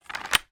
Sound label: medieval katana sword place in sheath fast; still north media